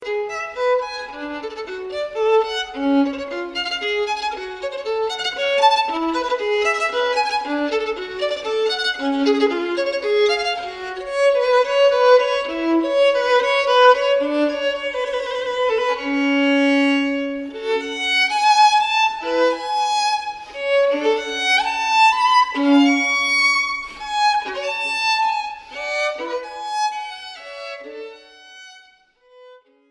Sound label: Passacaglia In G Minor For Solo; Biber